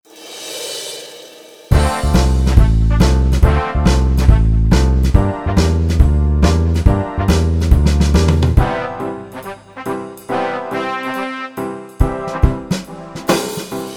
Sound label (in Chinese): 爵士大乐队 Big band; cubase 编曲助手